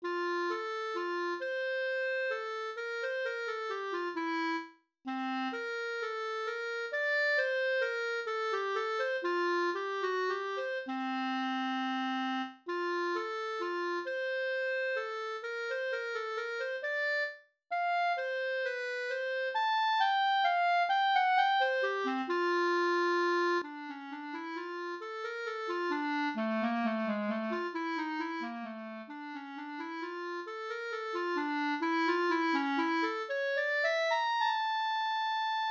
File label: clarinet